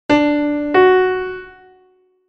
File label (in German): Intervalle sukzessiv Beispiel